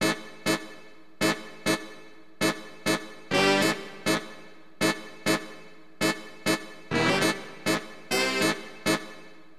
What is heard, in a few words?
FNK HORN, Acid Jazz Funk, Wind; LiveAct Pool